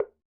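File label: Bongo Hi Slap, Roland BK-5; Percussion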